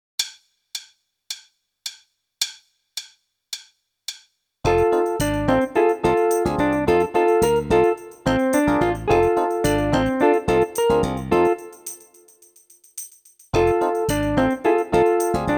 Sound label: Medium Rock; Meespeel CD